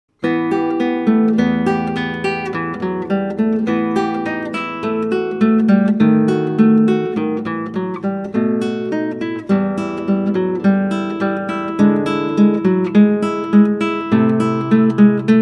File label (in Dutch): Klassieke Gitaar; Bach 50 Solos